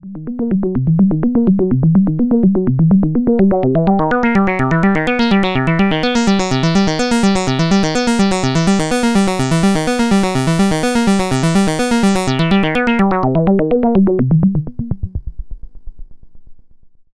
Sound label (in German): Pittsburgh KB-1 Sequenzer Noten und Presets PWM